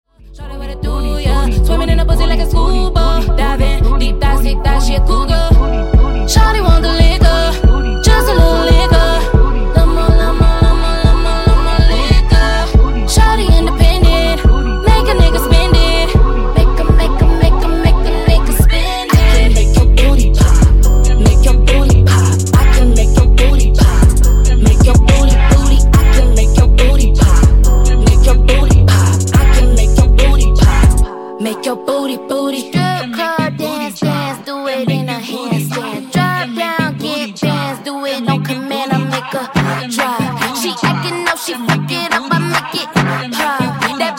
Dj Intro Outro 70 Bpm

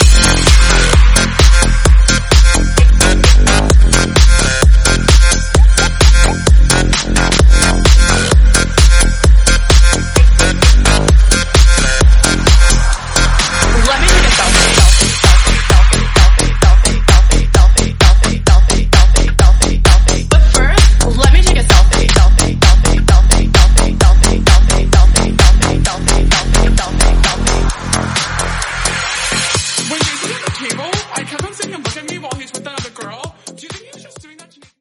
Tech House 130bpm Fm

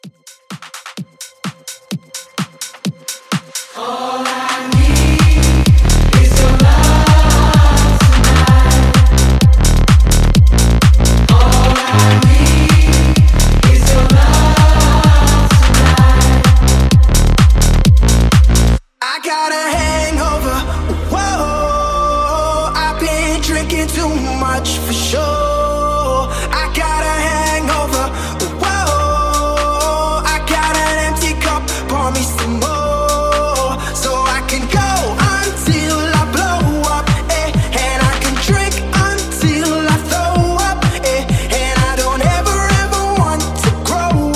house 128 clean

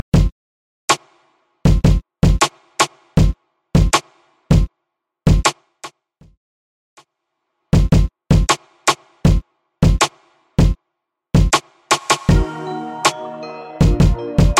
Top40 Hip Hop Mixshow Re Drum 79 Bpm

